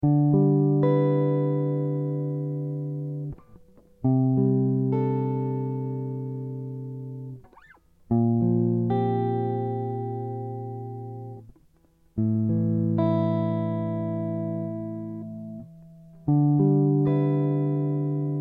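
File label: Dm 118 guitar chords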